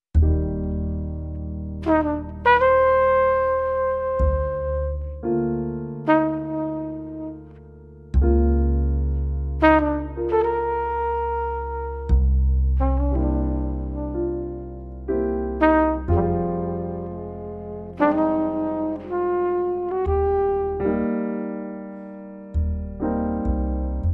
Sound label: Jazz Trio; ; Relaxing Jazz